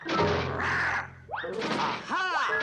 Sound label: Growl